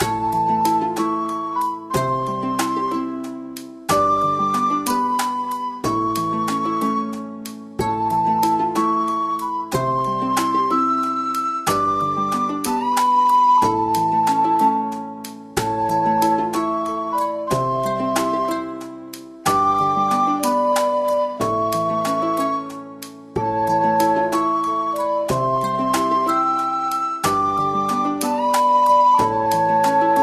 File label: Day Music; Fairy Godmother Tycoon